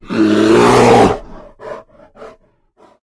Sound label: Bear Aggro